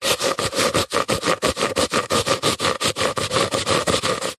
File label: metal pipe cleaning with tissue; zapsplat